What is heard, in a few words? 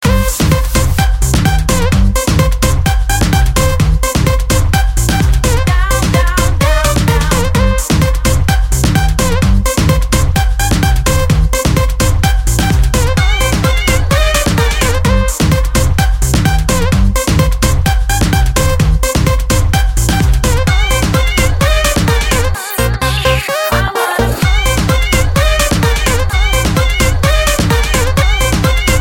Club Mix Sound